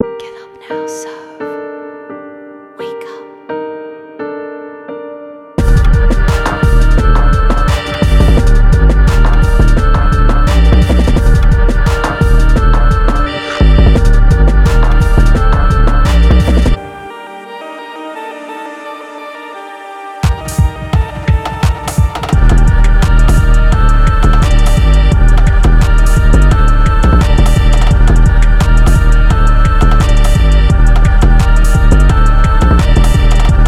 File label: 172BPM Gm